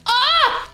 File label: ahh